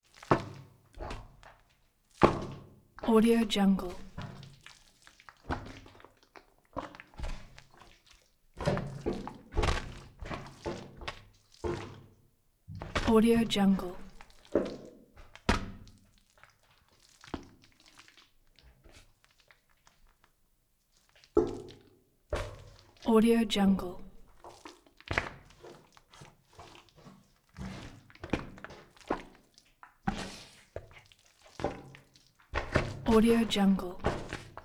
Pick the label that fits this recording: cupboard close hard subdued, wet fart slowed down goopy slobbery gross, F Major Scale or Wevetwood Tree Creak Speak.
wet fart slowed down goopy slobbery gross